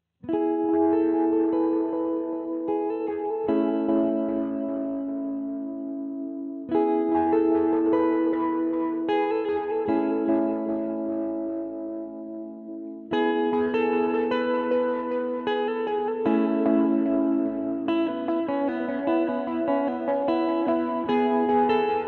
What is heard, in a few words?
TIME ANALOG; xgear